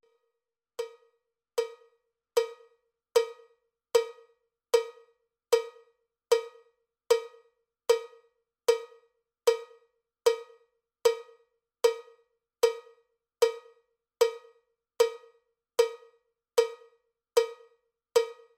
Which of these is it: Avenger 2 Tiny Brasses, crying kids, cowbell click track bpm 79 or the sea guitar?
cowbell click track bpm 79